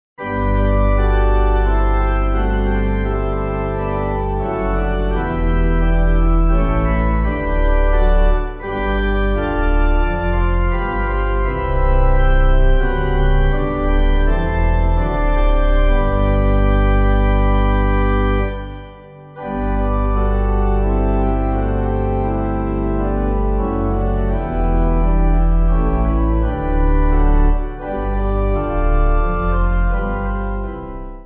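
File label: pipe organ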